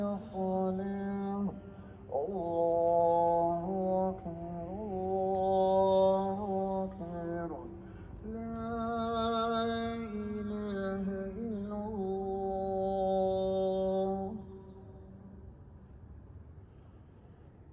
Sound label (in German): Muezin; vereinigte arabische emirate; dubai